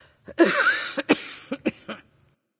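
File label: clear throat, female; smite; voicepack; hera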